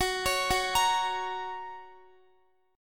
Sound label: Gb Major Gb Guitar; Gb arpeggiated